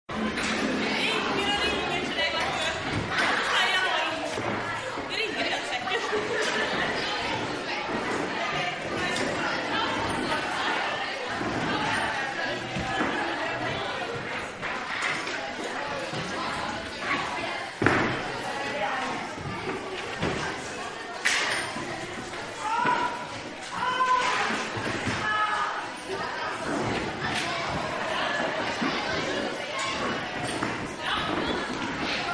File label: Classroom Noise